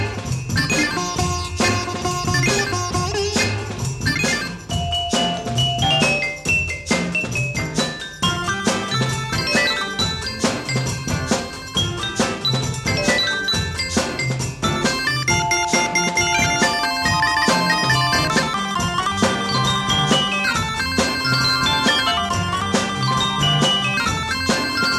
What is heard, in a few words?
Soul Sitar; khyber mail